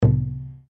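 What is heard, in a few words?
Drum Taiko piano, Japanese Taiko Drum; Miscellaneous Musical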